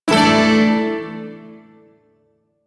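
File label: Shawzin Pentatonic Major Chord; Warframe; Duviri Shawzin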